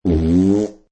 Cartoon Fart Long, Fairly long lasting, with upwards bend; Comedy/Cartoon; Funny Sounds